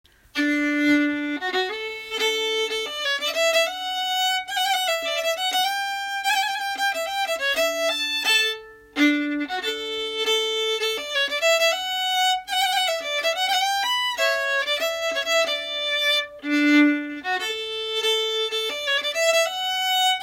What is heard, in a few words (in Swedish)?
Satspolskan; e Pekkos Per; bingsjolatar